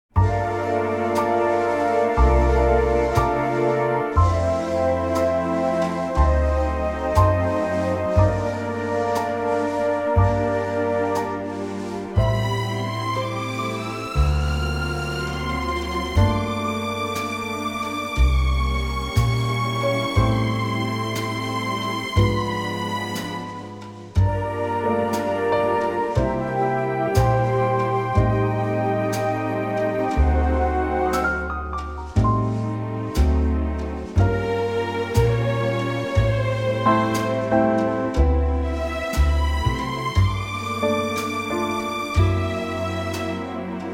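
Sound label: F orchestra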